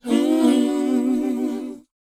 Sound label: WHOA A, Vocal R&B & Dance; ILIO; Vocal Planet